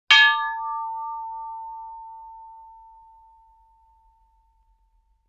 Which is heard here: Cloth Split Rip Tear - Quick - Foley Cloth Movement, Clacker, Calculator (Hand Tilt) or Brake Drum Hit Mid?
Brake Drum Hit Mid